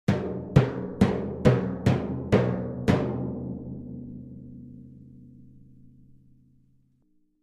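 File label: Timpani Hands Slow, mallets timpani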